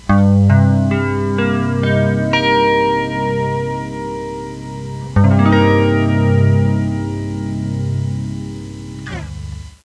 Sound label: Dm7 barre chord